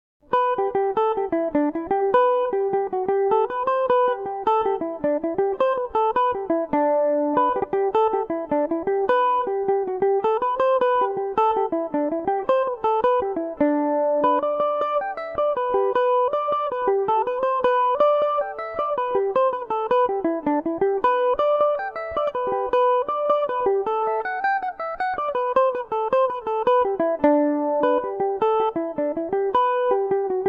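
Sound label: D mixolydian revelation, Irish mandolin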